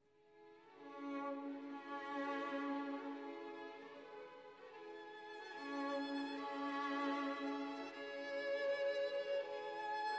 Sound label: Violin Concerto In E; Vivaldi